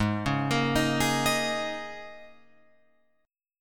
G sharp Suspended 2nd Suspended 4th, G sharp, down, Guitar, Open F; G#sus2sus4; arpeggiated; JGuitar